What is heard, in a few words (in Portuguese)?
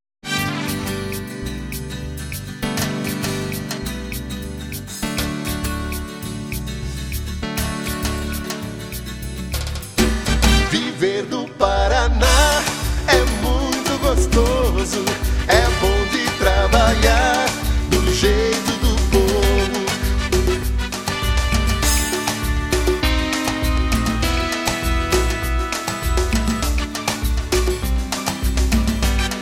Jengle espaco para locucao; Santos Filho; deputado federal 1998